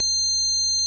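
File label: ORG Vox G, Continental organ; Continental2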